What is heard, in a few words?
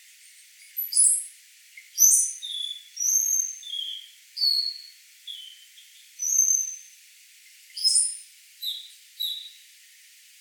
Molothrus ater; ecologia paisaje sonoro